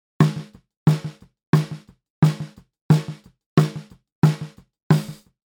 Snare Gated

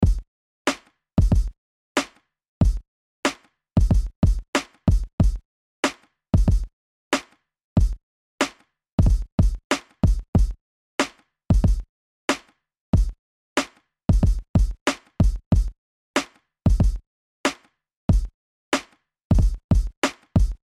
Boom Bap

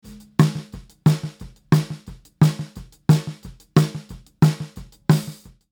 Snare Not Gated

